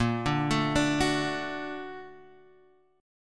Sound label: Bb Augmented Major 7th Bb Guitar Half Step Down, chord